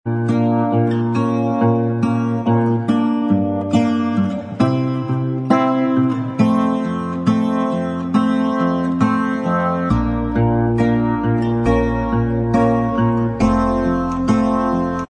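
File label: acoustic version instrumental track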